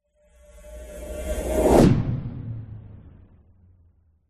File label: magic spell whoosh powerful energy pass electric